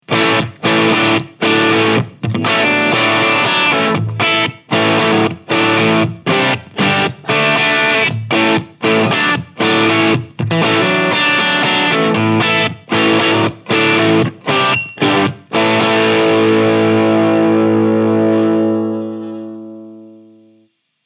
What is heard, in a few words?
Normal Channel Crunch; Soundcity Master One Hundred